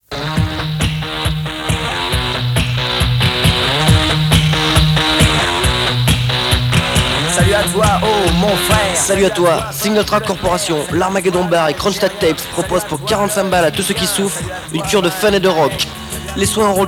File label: jingle